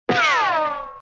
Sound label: run; disney; mashup